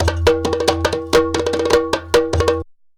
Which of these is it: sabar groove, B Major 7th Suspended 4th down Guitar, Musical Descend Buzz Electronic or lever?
sabar groove